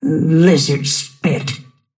death; snapfire

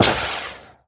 snake impact; weapons; dota; medusa

